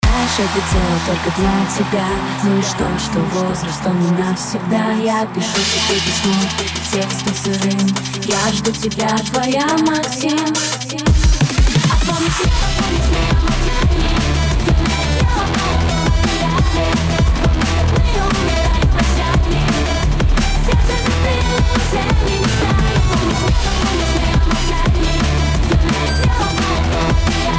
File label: DNB